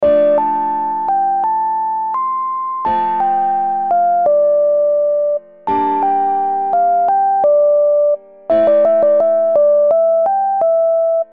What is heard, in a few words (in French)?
Octave différent